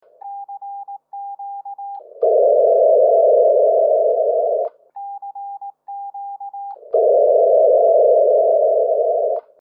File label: 10ghz echo